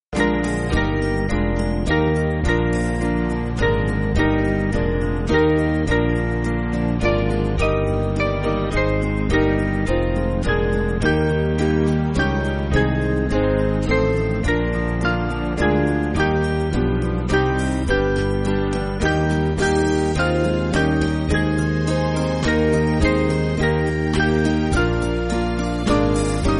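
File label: Band, Music Backing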